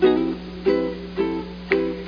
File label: uke